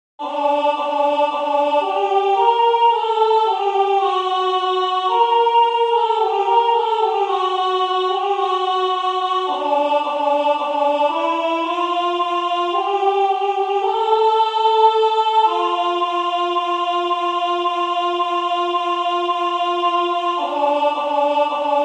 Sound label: alto; Three Ravens